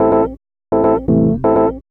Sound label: house synth 125 A, keys